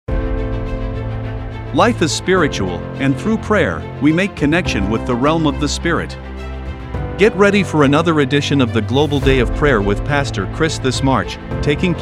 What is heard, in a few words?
JINGLE